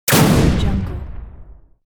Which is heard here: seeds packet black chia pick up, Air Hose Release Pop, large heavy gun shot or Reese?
large heavy gun shot